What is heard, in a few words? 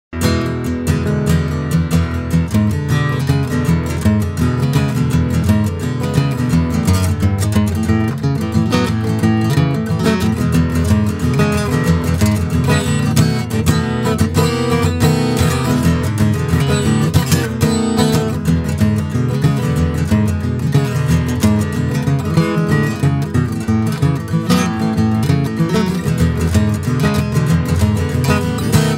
Guitar Sample